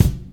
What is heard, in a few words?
clear kick single shot B key, Royality free bass drum single shot tuned to the B note